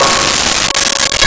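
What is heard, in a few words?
Nylon String Guitar Db6; Nintendo; July 2020 Gigaleak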